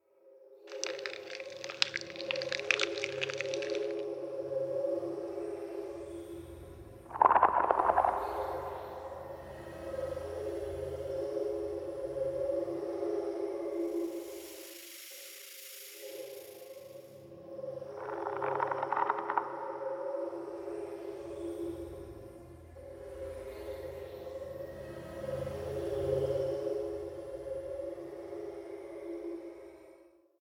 Acid Worm Small Idle

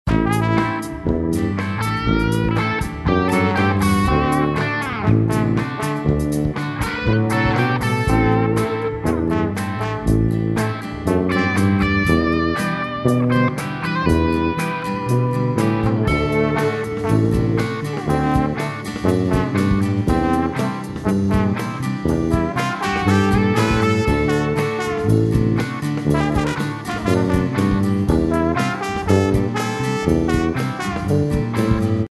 Lighthouse Band; club penguin

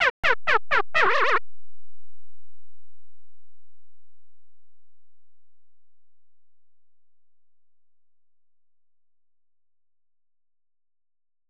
Password Error; super batter up; snes

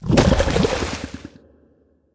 Swamp Monster Out

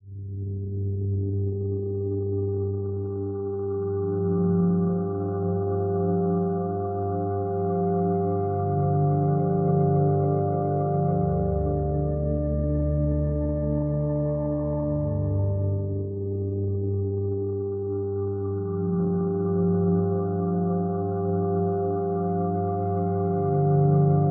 Intro Music; Remnants Of Naezith

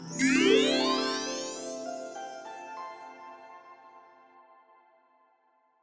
Stage clear; Mon Amour; macOS Switch Windows gamerip 2021

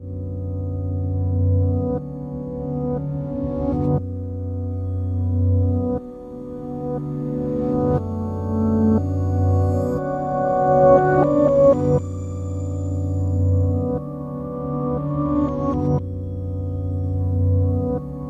Reverse Intro Scene Loop; Timelie

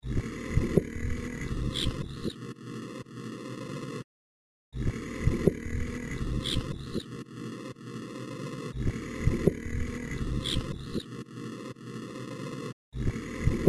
Bleeding; yume nikki fangame .flow

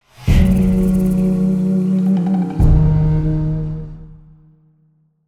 Game Over; From Dust; PS3; Xbox 360

